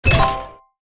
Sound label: Stack Land First Reel; fire Wizard; Axial